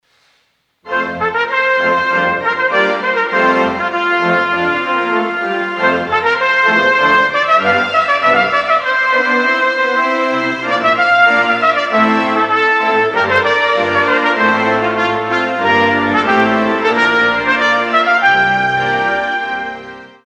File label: Festive Trumpet Tune